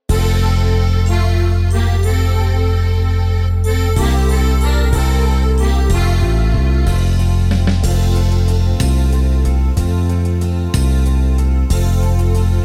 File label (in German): 6/8 Volkstuemlich; wersi